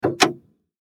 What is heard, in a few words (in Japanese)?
公衆電話ボタン